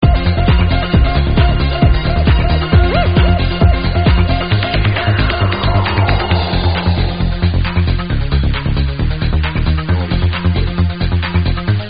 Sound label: step beat